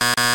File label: buzzer; Dice World